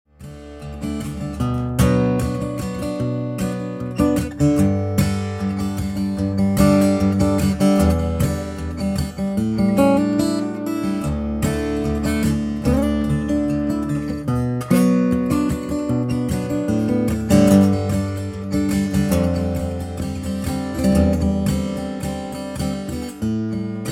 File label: Jumbo sample, guitar